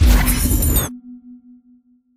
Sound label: Charge Up; Aladdin; Tales of Arabia pantheon